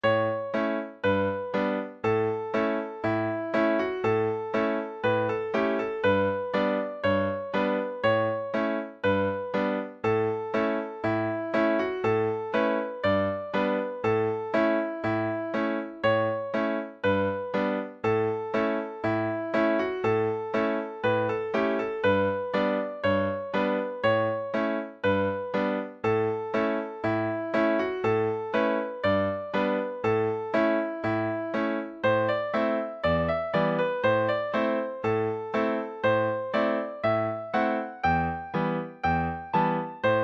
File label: harmony, session tune midis; kitchen girl